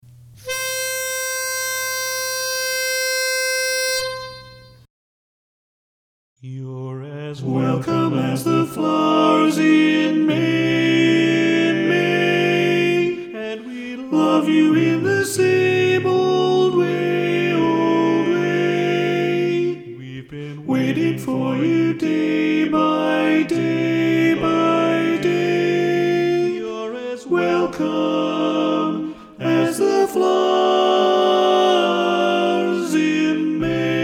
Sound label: Tenor